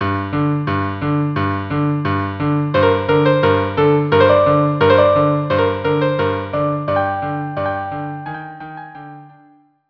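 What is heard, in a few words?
violin cello; Moment Musicaux 3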